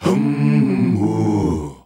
Male Voice, African Voices

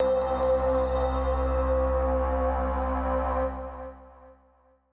Synth C, 140bpm, Grime; Zero-G Sound Sense Grime Scene; Construction Kits; Astoria

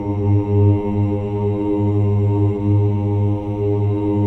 CHOIR OOS, G#2; Spectrasonics; Symphony of Voices

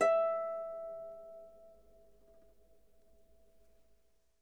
H E5, Harp; Miroslav Vitous - Percussion & Harp

